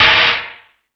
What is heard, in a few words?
More Cymbals, E-MU Pro-Cussion